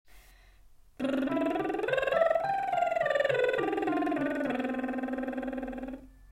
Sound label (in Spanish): Plumas lip trill Avanzado